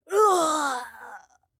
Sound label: Ruins Flying Sentry Death